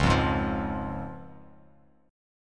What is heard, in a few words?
Db Major Db, Bass Standard; strummed; JGuitar